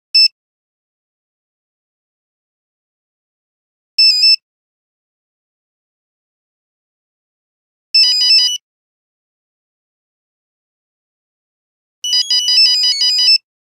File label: Nokia Buzzer; Windows Phone apps